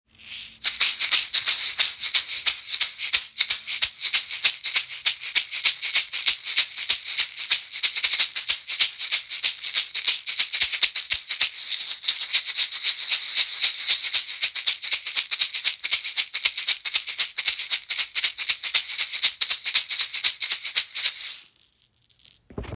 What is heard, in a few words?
shaka shaker sample